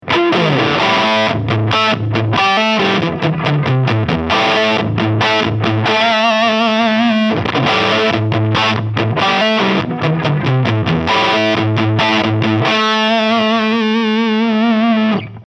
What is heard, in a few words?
Walrus Audio Silt medium gain